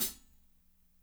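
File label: closed hi-hat; Spectrasonics; Burning Grooves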